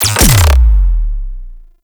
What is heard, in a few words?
Grenade Launcher Alt Fire; Thano Tech